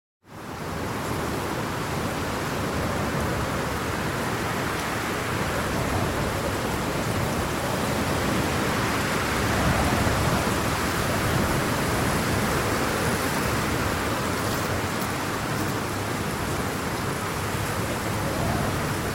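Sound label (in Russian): холодный ветер в осеннем лесу; Звук ветра в лесу; звук ветра в листве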